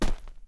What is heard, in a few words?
dirt hit; everness